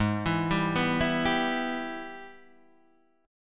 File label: Ab Minor Major 7th Sharp 5th, Guitar, Open D; down